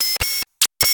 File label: scifi computer function; sound ideas